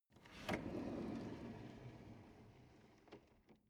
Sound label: drawer medium open draw long